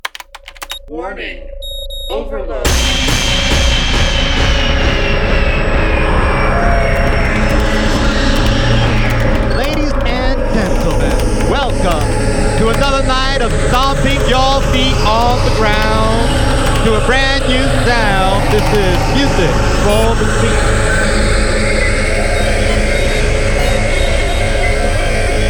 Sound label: show intro; Muzik 4 Machines